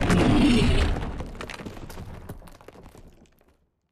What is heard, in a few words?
cutholoids hide; Stellaris; grand archive; ships